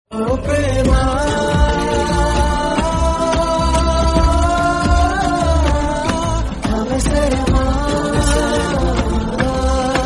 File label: songs telugu; sita ramam